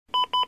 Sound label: robbery sound